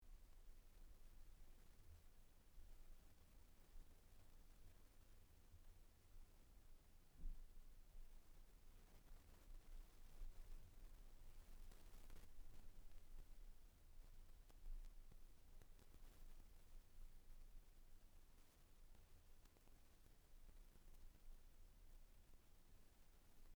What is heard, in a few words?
static noise